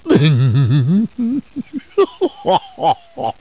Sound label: LAFF